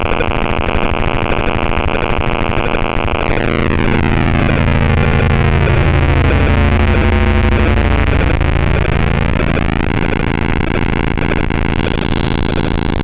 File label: SID wave type cycling